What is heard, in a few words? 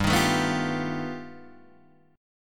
G Augmented 9th, Guitar, Open D